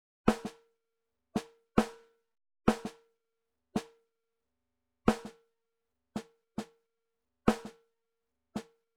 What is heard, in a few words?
Wave Drum SEP SD